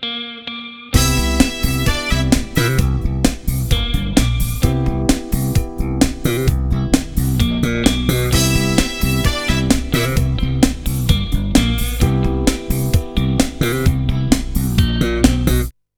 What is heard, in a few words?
LATIN, Bass Grooves; USB Soundscan vol.30; AKAI